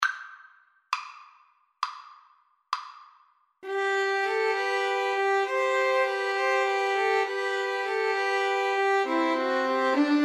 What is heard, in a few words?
pastorale, violin trio